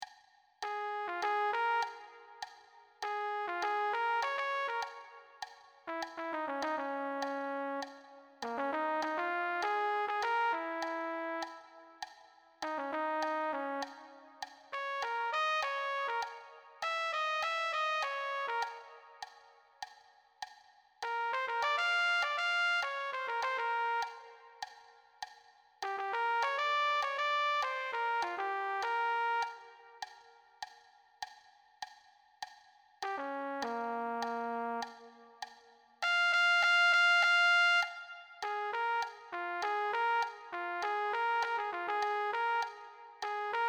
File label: trumpet funk; all state